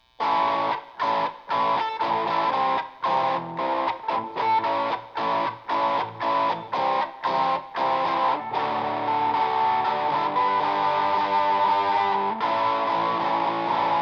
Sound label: electric